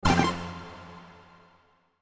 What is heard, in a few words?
Spin Hit; slingo-adventure